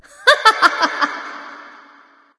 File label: laugh light; smite; voicepack; hel